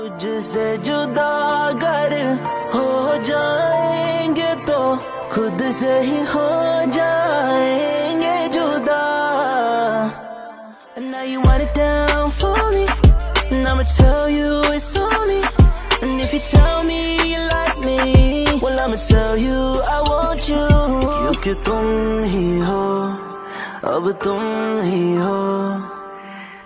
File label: hindi love ringtone, bollywood ringtones